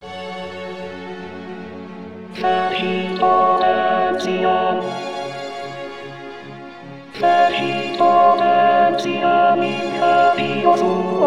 415 alt fecit potentiam

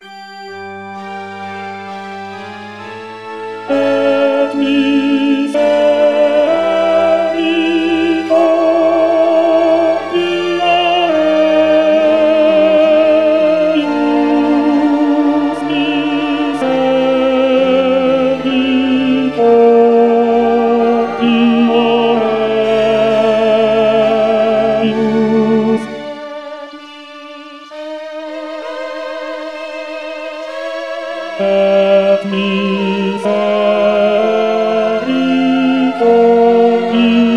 ten et misericor

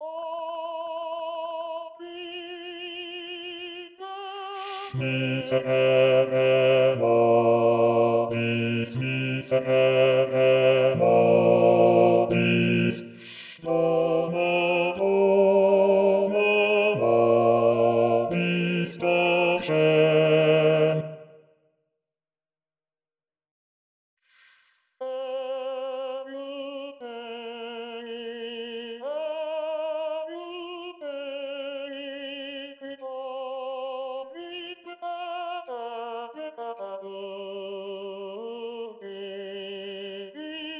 bass agnus dei

